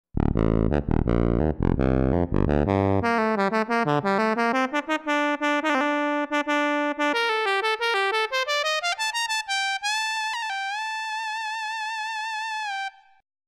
pulse

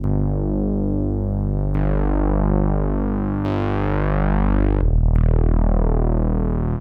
moog

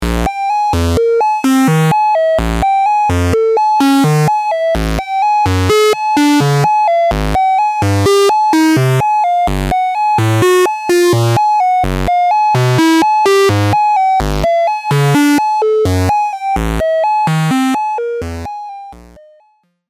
waveswap

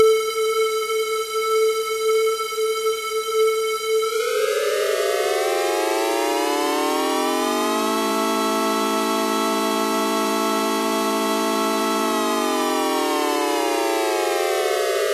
sqr 440

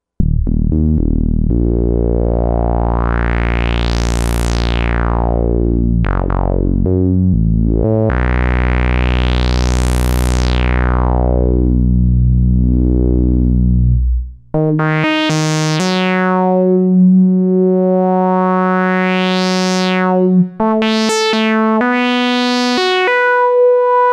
resonance

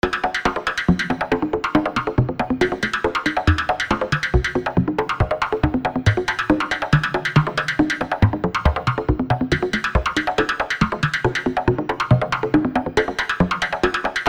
perc

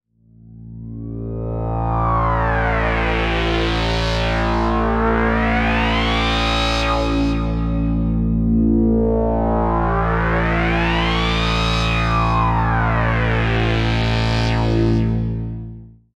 hard sync

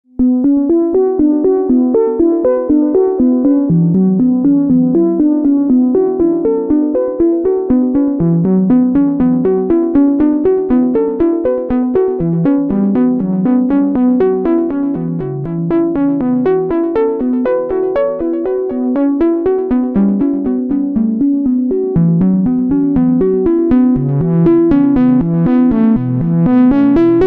sine

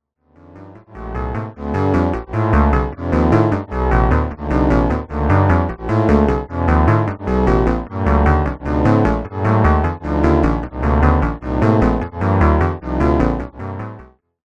all vcfs

